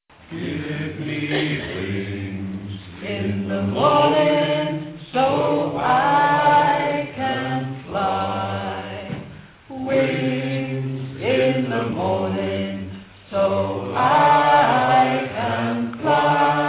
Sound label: bass